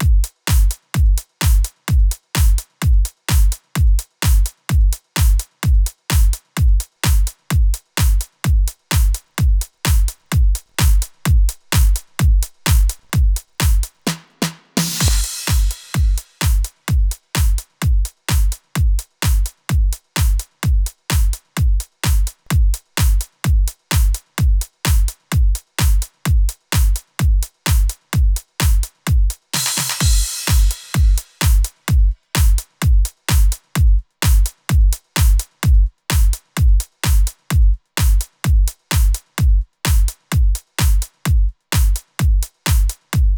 WesAudio Pur THD Iron

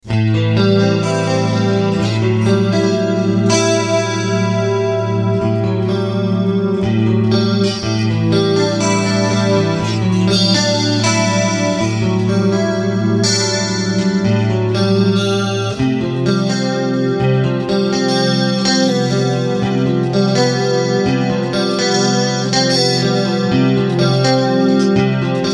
80s Pop Clean

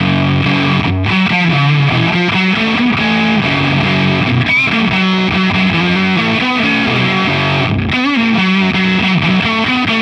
Humbucker neck; Beetronics Fatbee

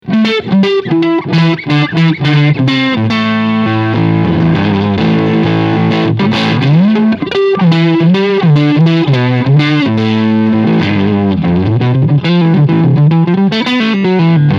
Booster Setting

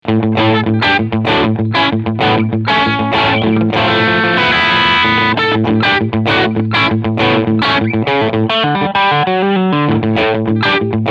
Jackson Audio Golden Boy Amber Drive pur

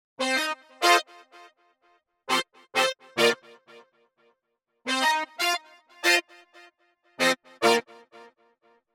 PC3 A8 Brass

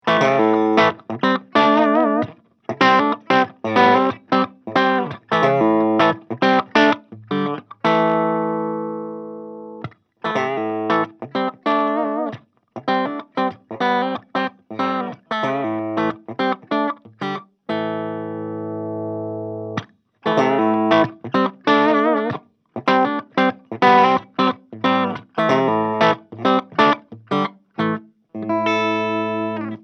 ESP LTD AA 1 Clean; BLKS Alan Ashby

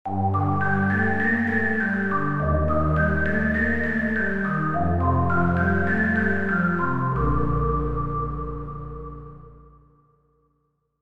Ambient

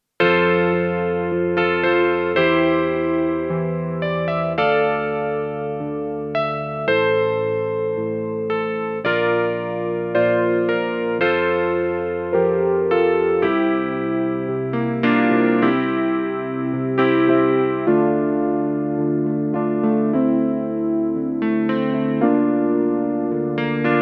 Dimension D Preset 4 CP 70

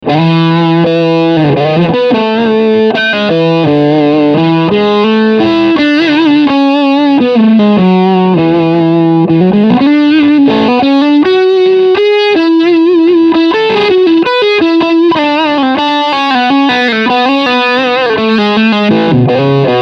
Tube Squasher Full Gain

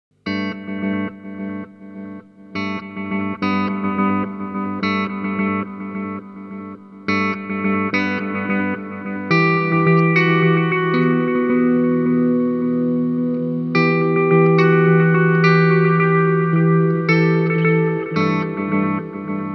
Boss DM 101 Non Linear

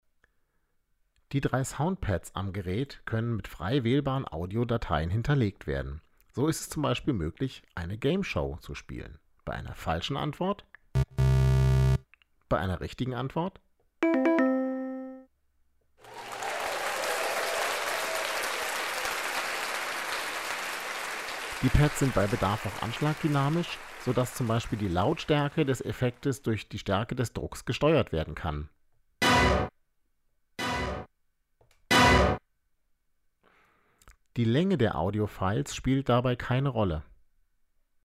Sound Pads; Tascam Ministudio Creator

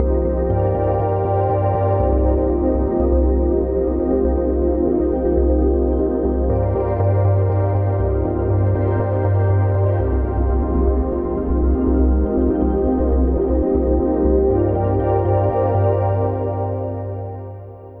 Quartet Chorus Ensemble Animated FFT; Reason Studios Reason 11

